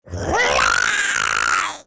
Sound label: goblin see